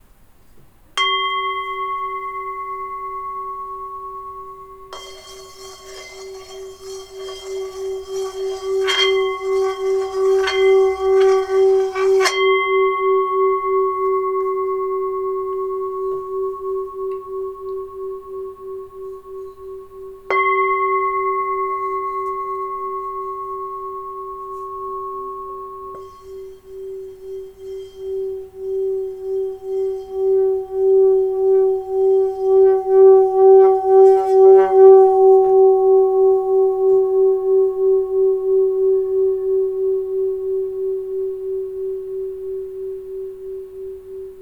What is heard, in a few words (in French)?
bol ancien sol